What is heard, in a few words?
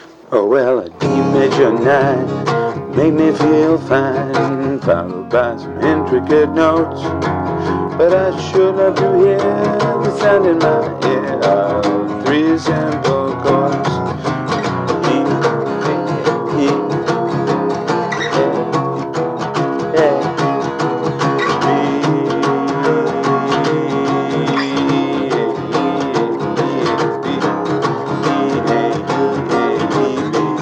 three simple chords